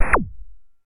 Video Game Fire Effe

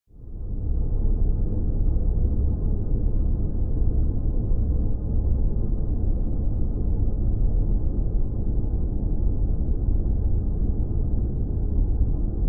Ambience Alien Hive, drones

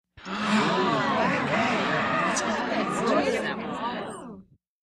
Gasp Astonish Chatte

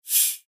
Processed Air Release

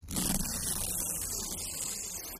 paper tear

